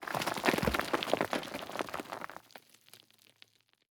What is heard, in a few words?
impacts; World of Tanks